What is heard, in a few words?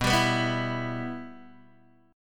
B Suspended 4th Sharp 5th, Guitar, DADGAD